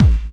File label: BD; Best Service; Dance Mega Drums